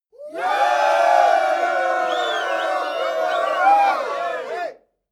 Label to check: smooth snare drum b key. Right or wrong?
Wrong